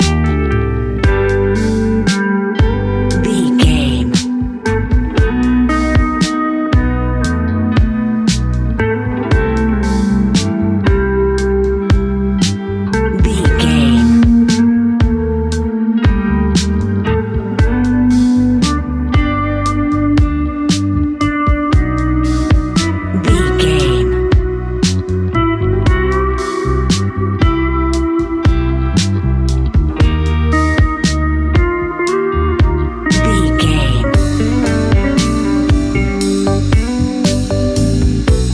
LoFi Relaxation, A relaxed and chilling piece of music; great for light documentaries, background music for tv shows